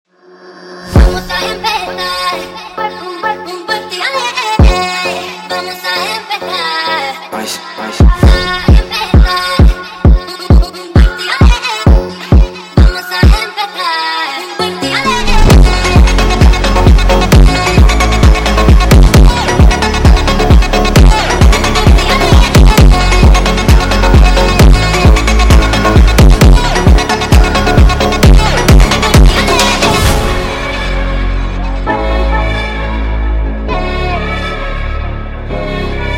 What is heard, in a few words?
Funk sped Up